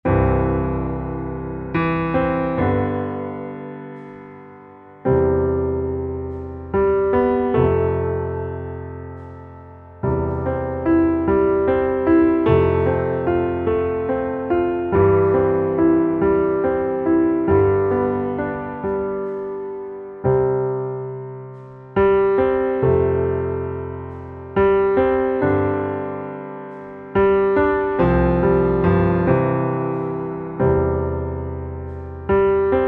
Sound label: Underscore PIANO; Worship Flow